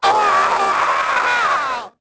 hit; The Elder Scrolls III Morrowind GOTY; XBOX

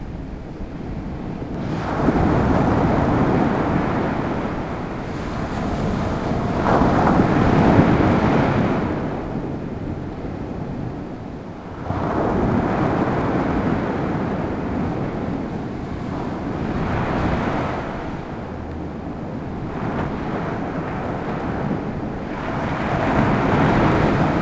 waves; Bali; Splashdown; XBOX; Soultree

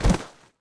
bodyfall grass, foley

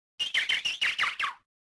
bird; yavin

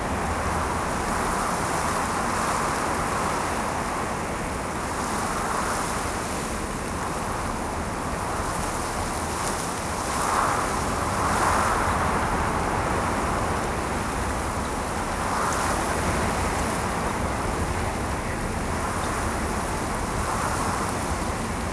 Wind Through Leaves Loop; Environment; BackgroundLoops

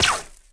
laser Bolt hit

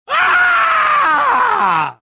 death; reborn

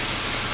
steam loop small

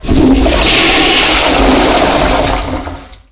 flush, water; Tony Hawk's Project 8; XBOX

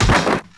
droid fall

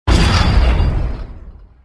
beam hit; movers objects

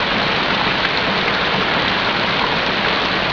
big fountain; water; Tony Hawk's Project 8; XBOX

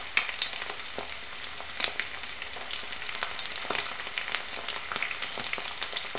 kitchen; Star Wars Knights Of The Old Republic I; XBOX

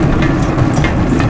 roll escallator, terrains; Tony Hawk's Project 8; XBOX

